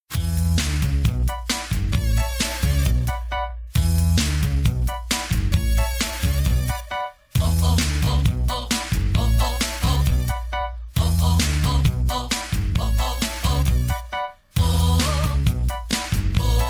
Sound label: ringtone up 1 step